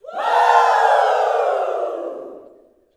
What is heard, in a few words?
WOO, Vocal Planet Gospel YELL SHOUT; Spectrasonics; Vocal Planet Vol.1 Gospel; akai